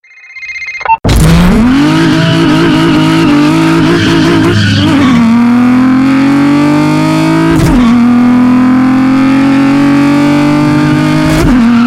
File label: Nissan 350z